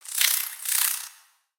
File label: Spider Idle; creatures mechanical; Lego